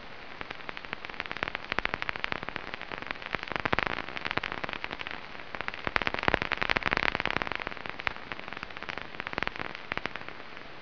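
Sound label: daubenton's, bats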